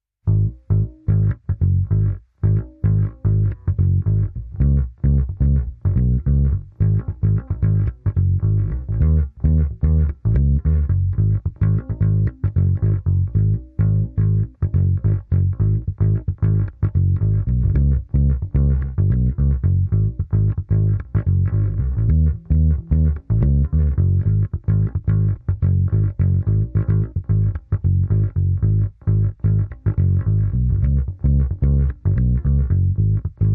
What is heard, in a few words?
Jive Bass Go Round